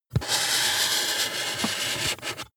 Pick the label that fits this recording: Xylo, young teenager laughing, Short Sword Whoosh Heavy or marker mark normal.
marker mark normal